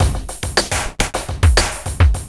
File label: housefunk, beats; Sonic Foundry (Sony Creative Software); Ugly Remnants VOL-2